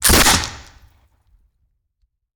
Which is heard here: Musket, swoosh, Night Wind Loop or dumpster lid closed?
Musket